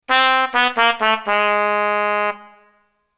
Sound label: Sad trumpet sound